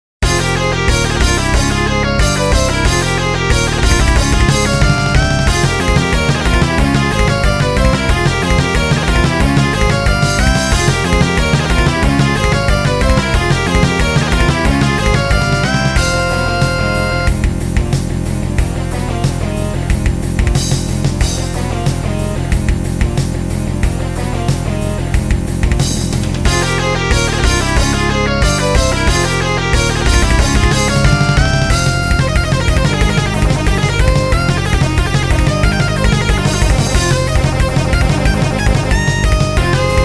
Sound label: Rock; winter memories windows gamerip 2024; Game Maoudamashii 7 Rock44